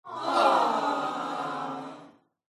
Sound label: small female group of 10 people shouting aaaah with disappointment. uniso; unison; Sound Ex Machina